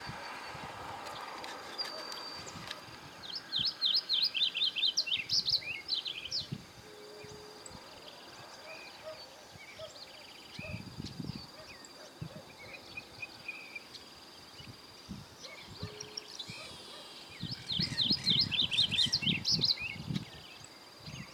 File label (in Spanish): arañero cara negro; aves